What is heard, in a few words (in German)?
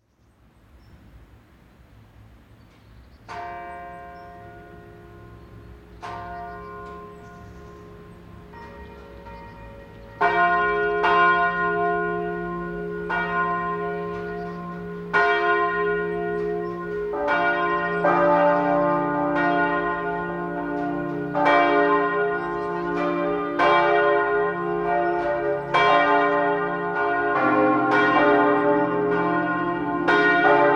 Bad Nauheim Dankeskirche Glocken